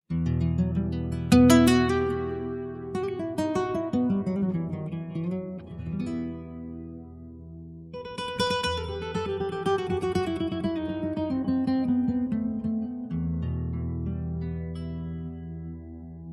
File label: flamenco